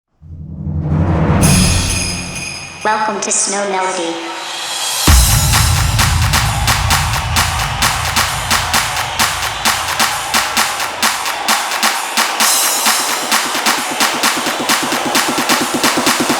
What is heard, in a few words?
Snow Changes to a Beat Again, Instrumental